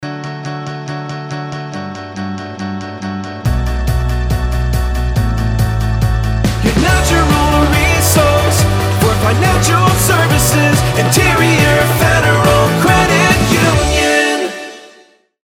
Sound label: jingle; interiorfcu